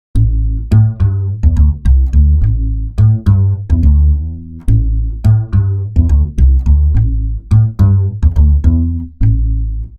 ircam granular chorus; motu machfive 3 testbericht

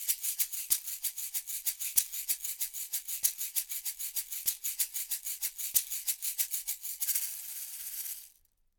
shaker; smproaudio mc01

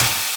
Snare

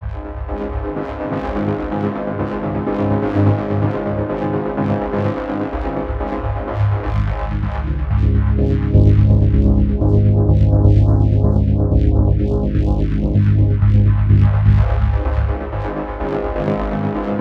synth; delamar mystery synth

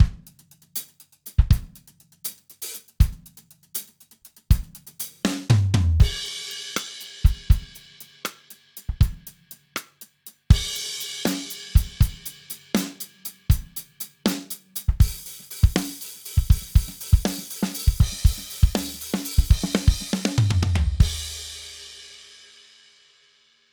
acoustic drum loops; presonus

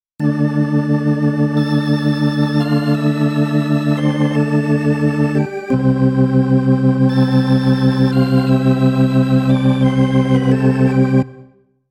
Yamaha Reface YC wave b

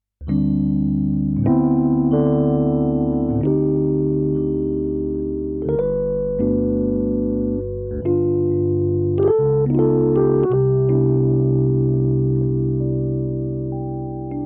Vivo SX-7 Rhodes; Dexibell

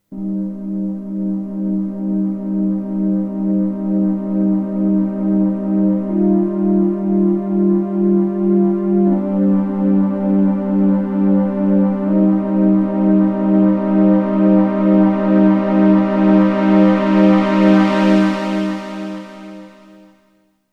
arturia matrixbrute paraphonic pad

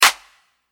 Clap

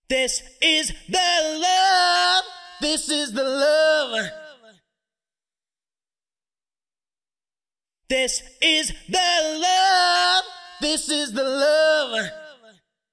vocal RS127 box 2.7kHz 6dB; softube abbey road studios brilliance pack

